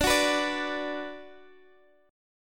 D 6th D down Guitar Drop D, chord

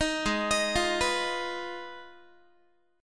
G sharp Minor 6th G sharp down Guitar; G#m6 arpeggiated; 18,16,18,15,15,x

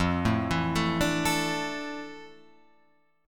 F Major 13th F down Guitar Open F; arpeggiated; JGuitar

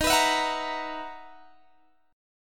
B Major 11th D sharp, guitar

